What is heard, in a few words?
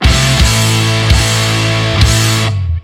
Hard rock sms